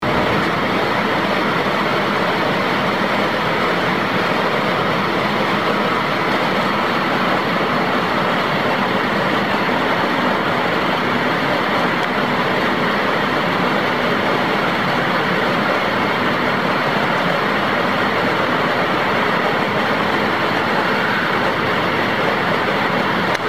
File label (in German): im Zug